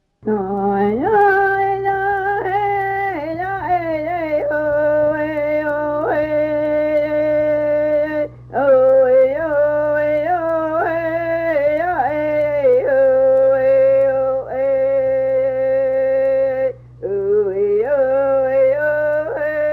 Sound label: Neho'eehe Namehota, hymnbook